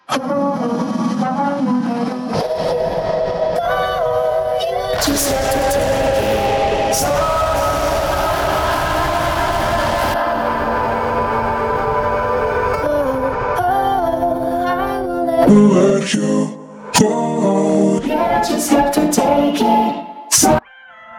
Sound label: vocal